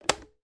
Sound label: household electric kettle lid open